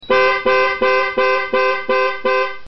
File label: honk honk